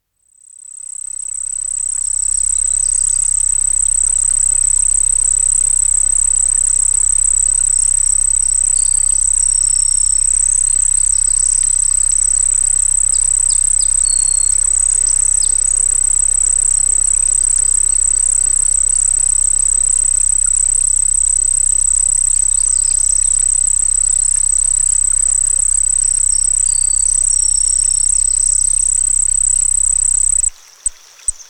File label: Crickets Singing By a Pond; Dr. Sound Effects; 210 Nature Sounds - 20 Hours of Relaxing Natural Ambiences for Meditation and Sleep